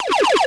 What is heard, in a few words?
Lazer death; SFX